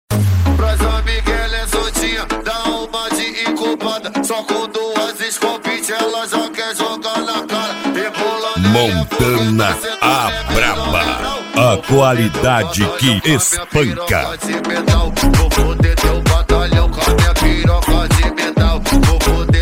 cd montana a braba 2025

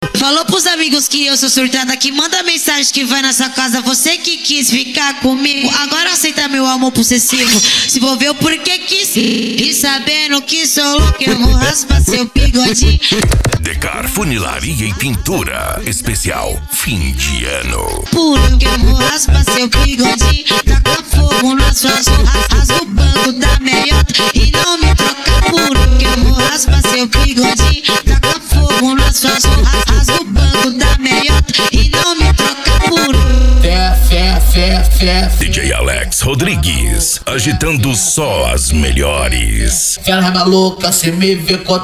funk ritmado

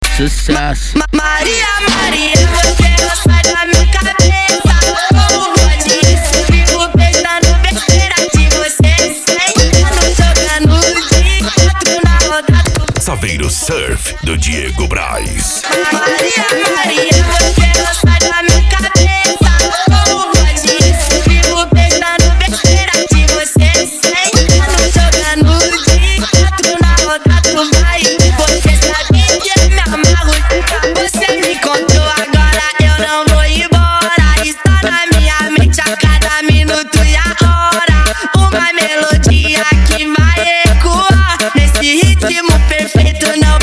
funk consciente e funk ritmado

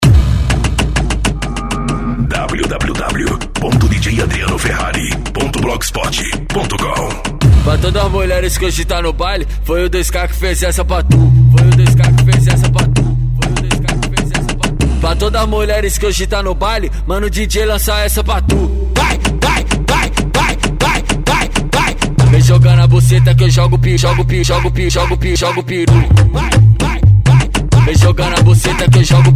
cd so funk bass

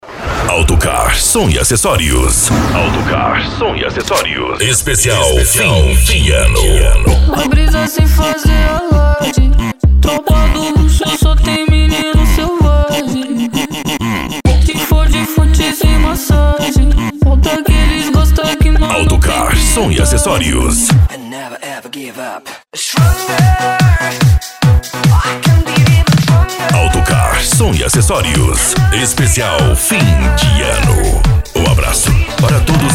funk agressivo ritmado e funkadao

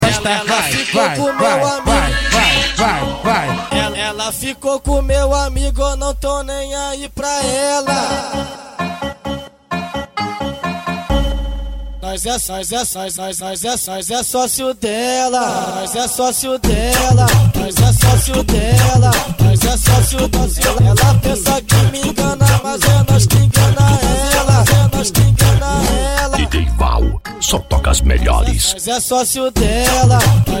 funk bass

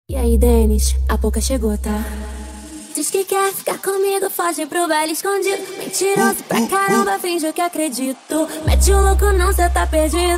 funk hits verao

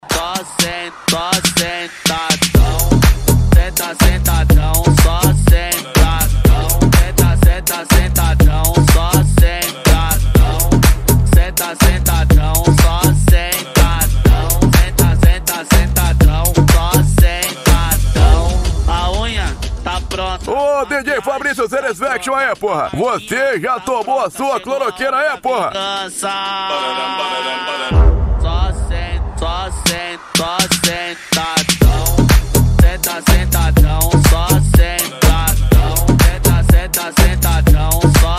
sound car mala aberta e mega funk

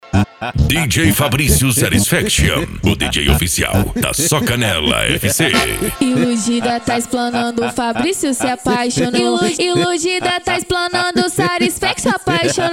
funk e lambadao

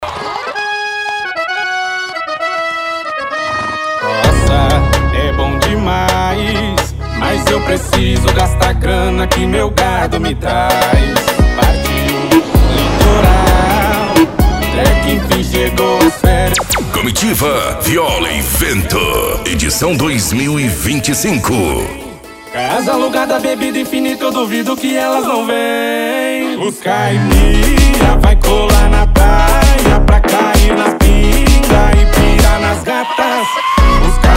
comitiva viola e vento

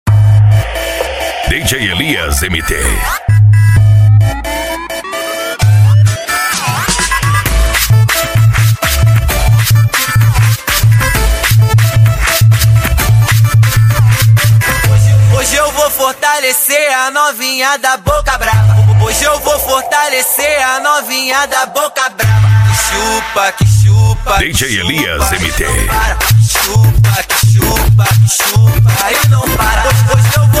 funk bass e sertanejo

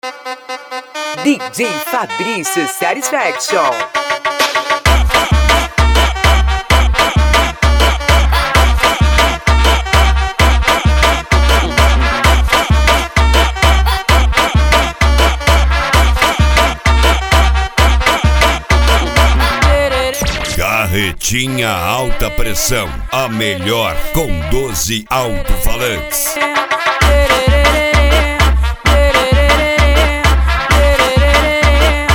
ritimada agressiva

